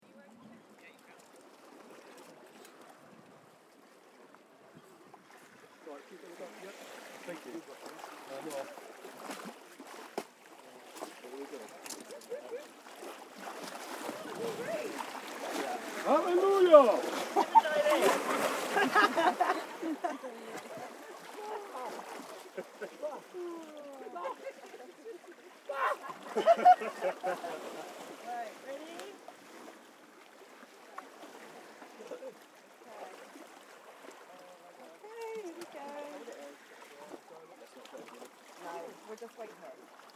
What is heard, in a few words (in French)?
Plongeon